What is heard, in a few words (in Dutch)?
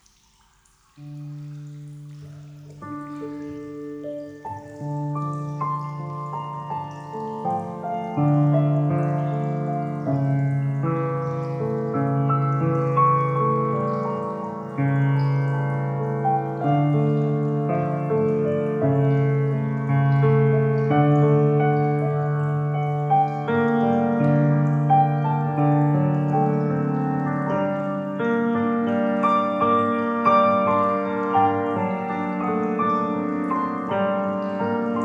piano improvisatie